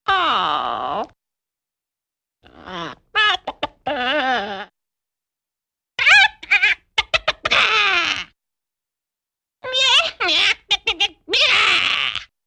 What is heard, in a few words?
Funny Sgl Chicken, chickens